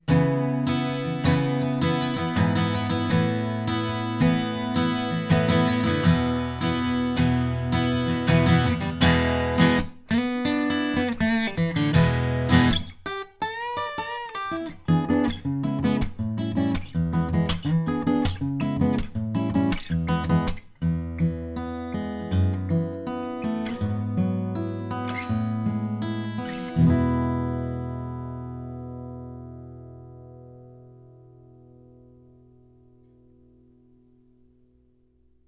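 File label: Reflections Acoustic Guitar Wet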